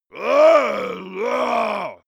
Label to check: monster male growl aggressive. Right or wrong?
Right